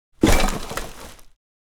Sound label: Garbage bag throwing away with impact trash can